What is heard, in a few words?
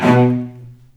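B1 arco short cello, right; East West; The Ultimate String Collection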